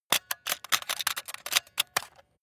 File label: metal handling mechanical click spring long